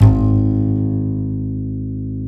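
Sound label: double bass G#1; Univers Sons; Jazzistic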